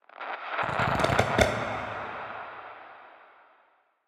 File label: mood, ambient nether warped forest; minecraft snapshot